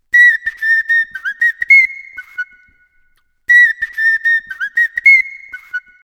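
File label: bellbird; Korimako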